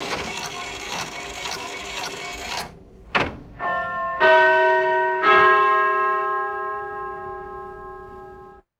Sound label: large clock tower bell chiming